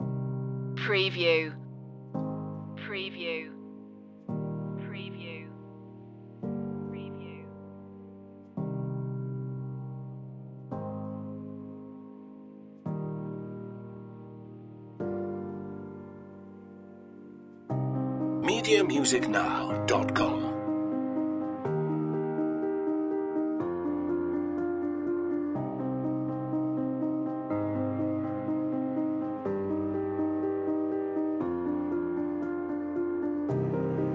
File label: the fall no lead piano